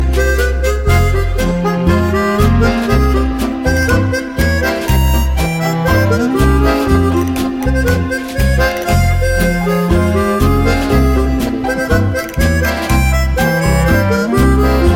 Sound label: Tango